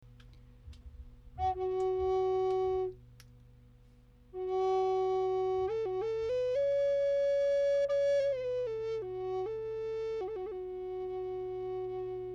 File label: flute